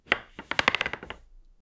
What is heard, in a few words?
chess piece moved on wood